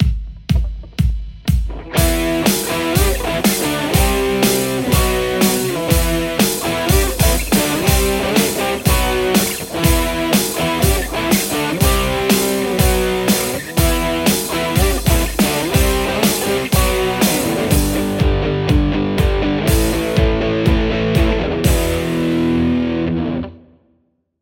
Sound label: lespaul; aradaz green